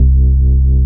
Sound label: DM BAS, Dance Mega Synth; Best Service; 90 s Sample CDs